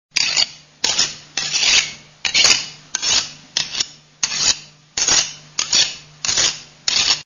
Aiguiser couteau; bruitage; Armes

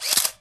Photo declic

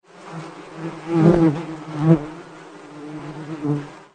Guepe, Animaux; bruitage

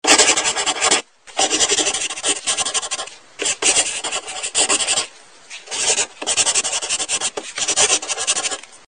Crayon rayage; bruitage; Electro-menager

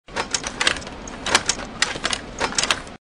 Diapositives; bruitage; Electro-menager

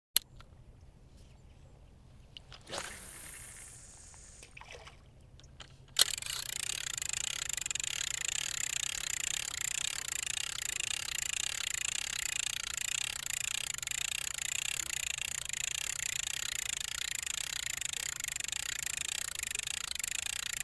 Pêche au lancer; bruitage; Liquides

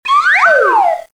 Sifflet

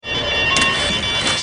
Ambiance hopital; bruitage

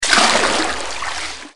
Plongeon; bruitage; Liquides

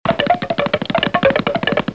Course, Cartoon; bruitage

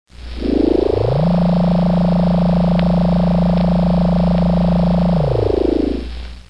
Ascenseur vaisseau

